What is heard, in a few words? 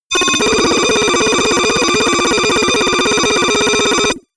notification data transfer aggressive